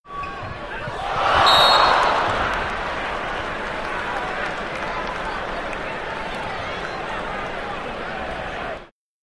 Basketball Game Cro, sports arena

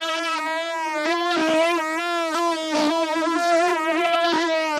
fly buzzing

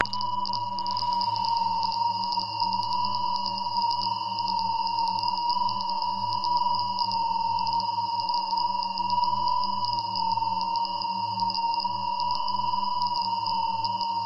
Scanner 50s Style Old Sc, communications

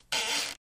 Plexiglas Squeak

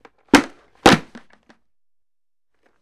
Football Helmet

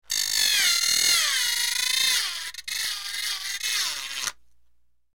Sporadic Metal Drill, air tool

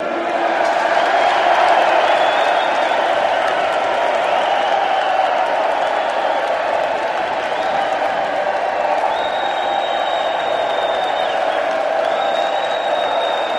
Crowd Cheering, big arena

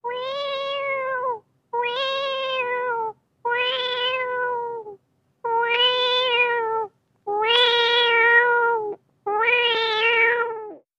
Cat Meow Plaintive; animals cat

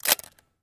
Antique Camera Shut, shutters; shutters & movement

